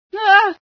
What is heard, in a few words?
scream, female sci